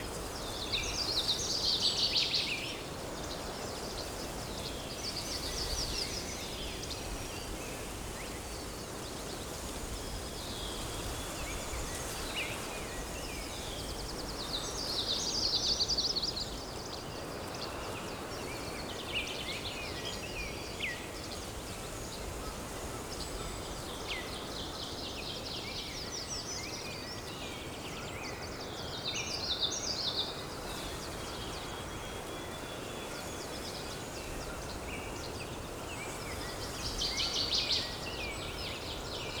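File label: ambience potluck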